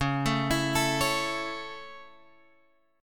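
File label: D flat Minor Sharp 5th, Guitar Drop D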